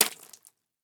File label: impact mud drop wet splash